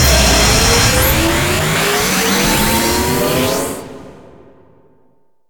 big Win; eggomatic

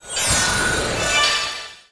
random Symbol Transform; fairyred

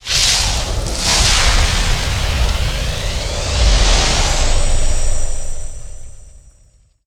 nearWin; narcos

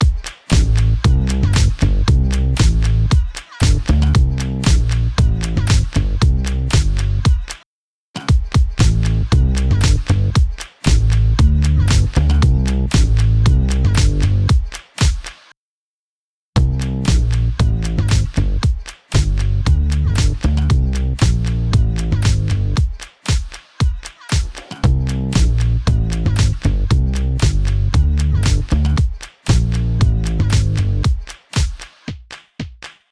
badass groove; pork knox

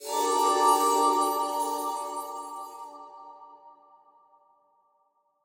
near Win Wild Spin End; wild bazaar

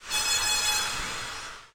heartbeat; superstriker

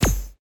cash stack sym fall landed; vegas night life

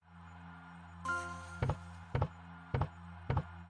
large Win; twin happiness

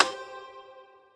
button Click; hiddencoinsofegypt

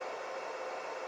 reel spin; starburstxxxtremeusa

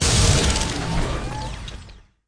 paytable Exit Animation; wildotron 3000

